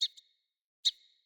Wake Bird Idle; Midrath; Dendria

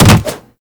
Jump Attack Default; Wall Run; Footsteps

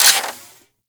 Harpoon Gun Mech Stop; Warframe; Sharkwing; Grineer Harpoon Gun

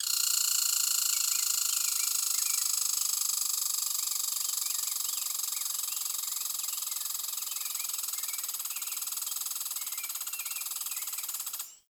Tree Squirrel; Warframe; Ambience; Nineteen Ninety Nine

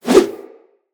Melee Idle Whoosh; Weapons

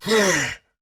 Pain; Warframe; Dax Knight; Battle Barks

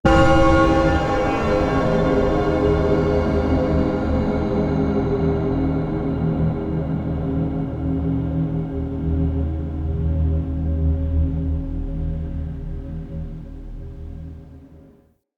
Bell Puzzle Ring B

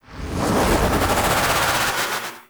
Power Charge; Warframe; The War Within; Mirror; Cinematics

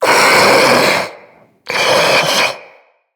Infested Puss Ancient Idle

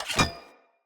Sphere Polearm Fast Equip, Weapons; Player

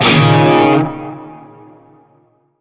Shawzin Yo Chord; Warframe; Narmer Shawzin

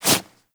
Generic Quick Foley; Warframe; Characters

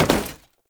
Step Metal Perforated, Foley; Warframe; Oraxia

